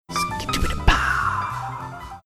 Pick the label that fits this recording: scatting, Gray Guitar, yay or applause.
scatting